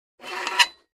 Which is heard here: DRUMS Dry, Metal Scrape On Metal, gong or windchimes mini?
Metal Scrape On Metal